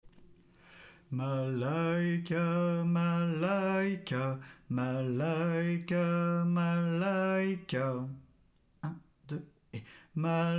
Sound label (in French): Homme